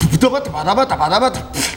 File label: VOC, Gipsy